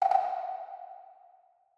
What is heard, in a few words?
nudge; rise of maya